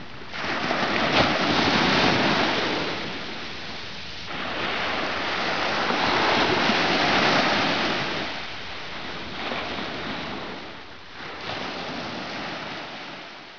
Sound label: Water, 水声; 特酷声音素材库